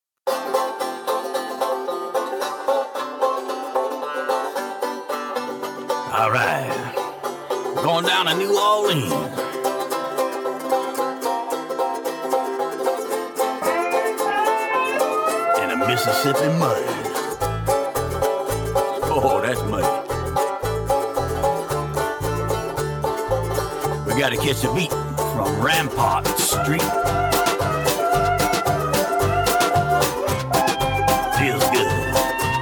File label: Ragtime